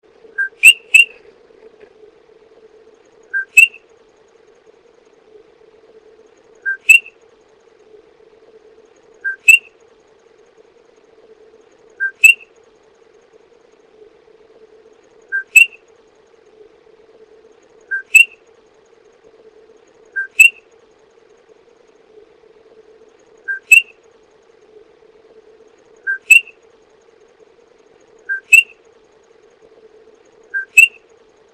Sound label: coqui vocalization